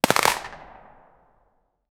30 mm cannon one shot; artillery

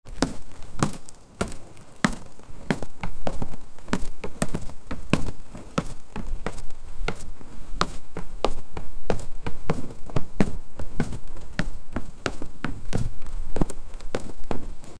quick running sound wood; atmosphere

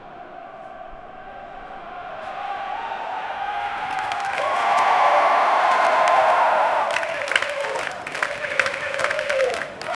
meters sprint from a, running

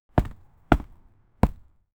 playing foot for football

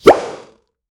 cartoon

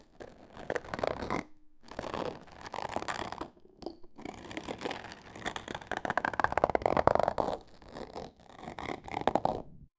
bear movement porcelain, dragging

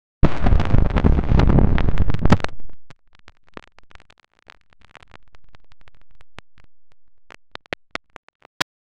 add intense visual fx gli, lightning

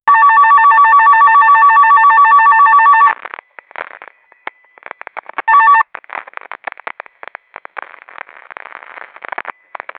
the frightening rattle an

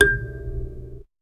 kalimba mbira thumb piano

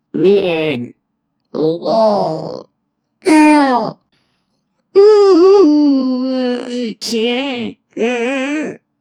memory game, card; boardhub

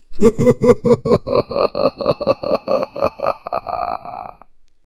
scary monster laughing

